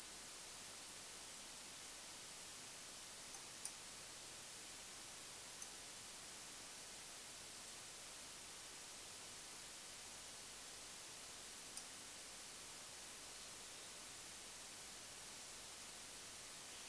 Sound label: Crt Tv Whine